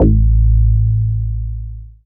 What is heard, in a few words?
techno, BASS; Techno Trance Essentials